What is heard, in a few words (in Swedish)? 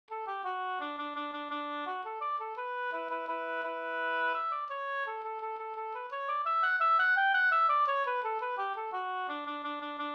vals fr Liden; Hastbytarvalsen